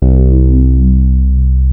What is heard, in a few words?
rez sweep, Chroma Polaris; Rhodes